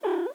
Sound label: Cat Purr